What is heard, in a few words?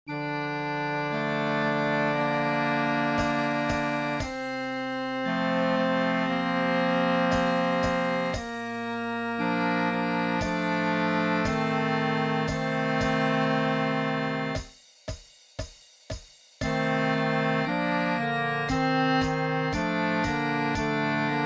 concert duet